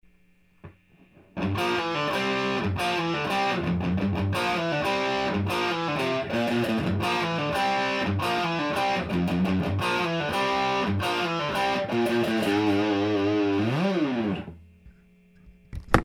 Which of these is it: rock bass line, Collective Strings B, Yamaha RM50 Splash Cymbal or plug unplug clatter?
rock bass line